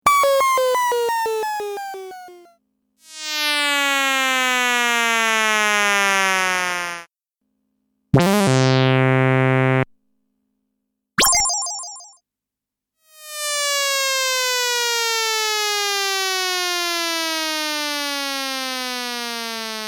Retro Video Game, 7 x classic sounds from vintage 8 bit video games.; Entertainment Systems